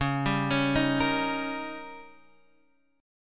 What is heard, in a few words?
D sharp 7th C sharp, guitar